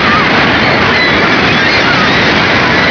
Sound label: ocean heavy; PC Gamer IT CD 41; Daikatana